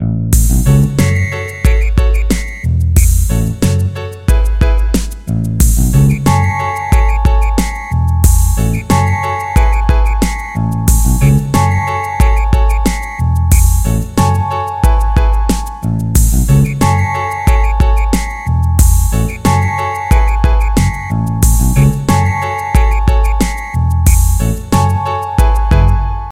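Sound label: rap, ringtones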